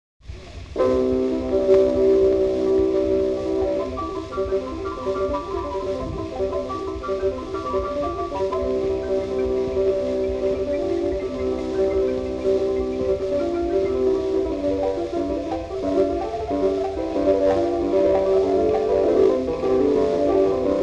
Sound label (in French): Rapsodie in C Major; Rapsodie d'Auvergne; Op. 73